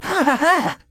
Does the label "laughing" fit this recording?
Yes